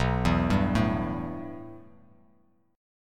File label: B 7th Suspended 4th Sharp 5th B down Bass Standard; B7sus4#5 arpeggiated; chord shape; JGuitar